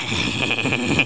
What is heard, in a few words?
eeghhuhuhuhhhu; World Of A1200